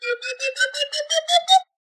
slide whistle upwards notes

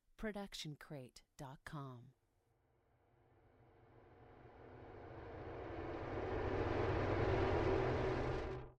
distortion wave; SoundsCrate

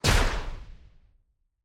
Sci-Fi Gun Shot; SoundsCrate

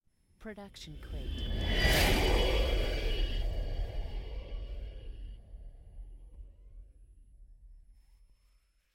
High Woosh; soundscrate

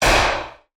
Gun Shot; SoundsCrate

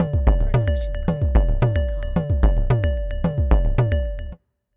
quirky beat logo; soundscrate

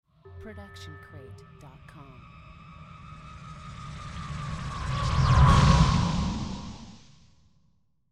Sci Fi Teleport; SoundsCrate

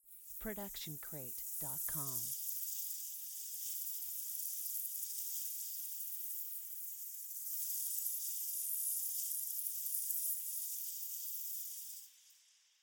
Magical Portal Shimmer; SoundsCrate

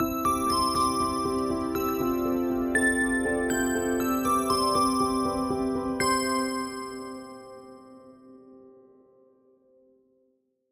positive note; soundscrate

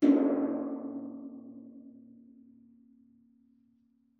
hollow metal bang; soundscrate

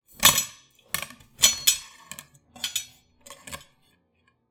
knives various; soundscrate

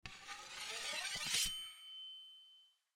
Draw Sword; soundscrate

